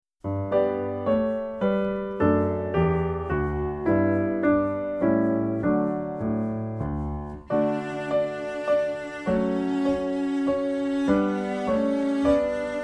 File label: French Folk Song